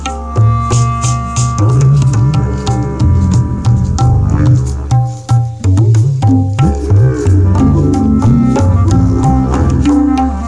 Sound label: RHYTH, LOOPS